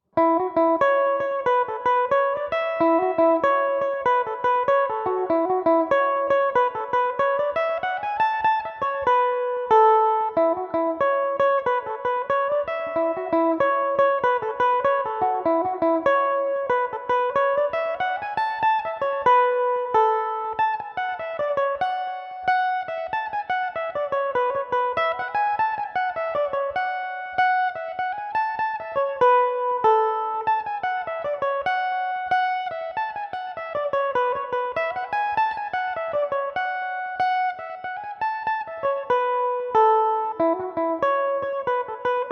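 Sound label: A major, Irish mandolin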